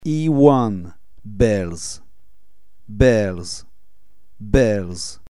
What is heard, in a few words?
bells